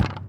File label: Ticket Counter Perc; Houston; Moody Park